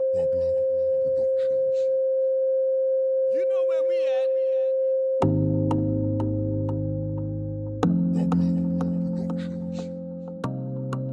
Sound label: Reggae Dancehall